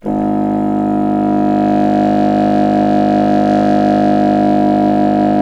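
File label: wind contrabassoon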